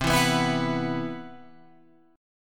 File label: C Major 7th Flat 5th C down Guitar Open F; CM7b5; strummed